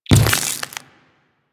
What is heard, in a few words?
Ice Proj Form; Warframe; Corpus; Arachnoid Camper Terra; Abilities